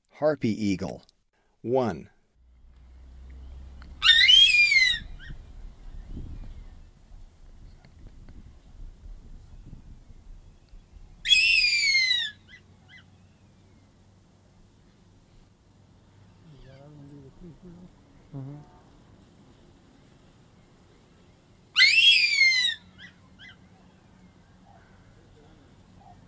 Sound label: Harpy Eagle; S. America; Eastern Lowlands